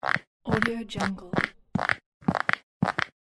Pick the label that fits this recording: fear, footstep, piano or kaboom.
footstep